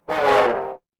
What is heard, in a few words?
feedback fail